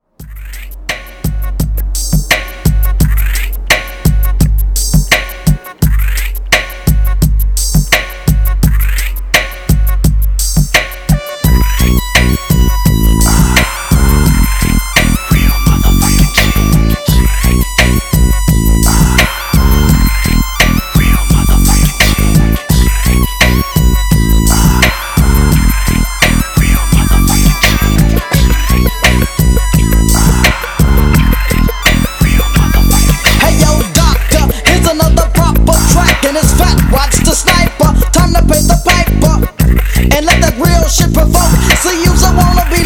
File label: ring tone